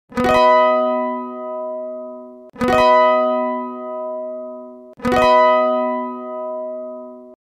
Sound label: Mandolin